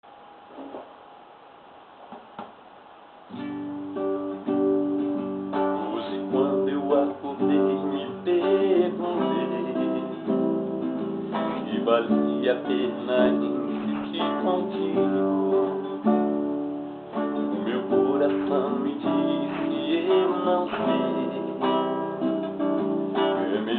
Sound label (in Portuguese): coracao voz violao